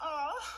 awww

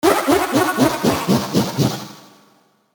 laugh sound; mario exe; diagoras

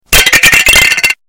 lego breaking loud

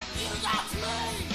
mail; jdg

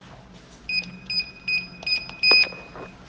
code unlock

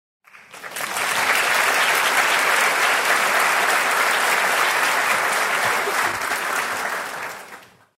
audience clapping

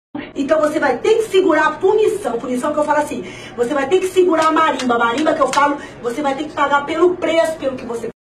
marimba; ines brasil

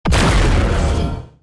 shoot; pixel gun 3d; event horizon

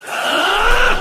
arrrgh; bill cipher

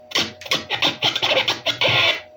blam

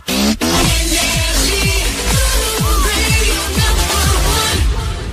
jingle; nrj

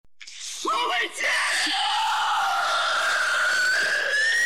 scream

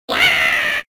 gyaaa

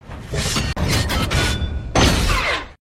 transformation; megatrons

